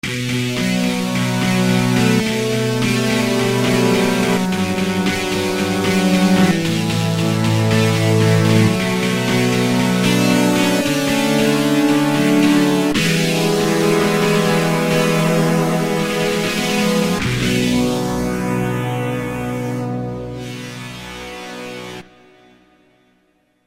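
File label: Grunged, Kurzweil K2000, ELECTRIC GUITAR; Kurzweil K2000 Contemporary ROM; Contemporary ROM Programs and Demos diskette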